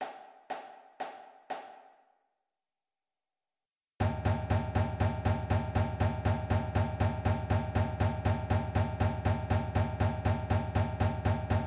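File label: Eighths, Drumline Warmups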